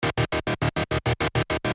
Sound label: low riff